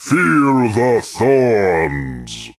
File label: Rotten Forest Branches